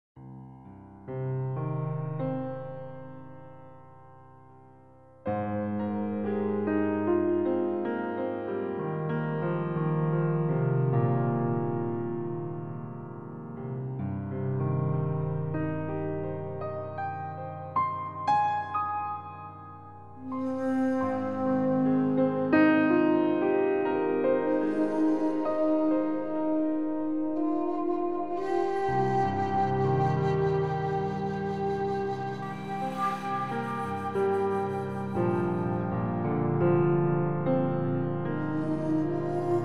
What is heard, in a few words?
shakuhachi sketch